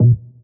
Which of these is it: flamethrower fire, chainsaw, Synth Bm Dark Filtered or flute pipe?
Synth Bm Dark Filtered